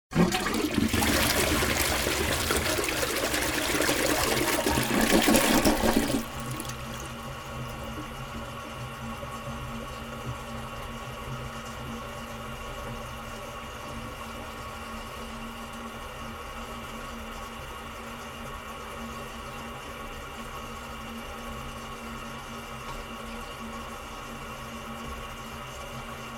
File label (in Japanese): ohuro